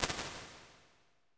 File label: Museum, Impulse Responses; Medium Spaces; Indoor Spaces; Apple